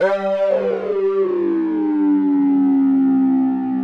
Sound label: Synt Howling Sweep